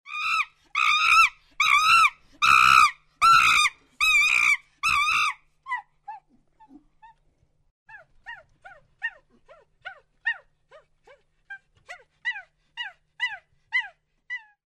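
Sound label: sounds of monkeys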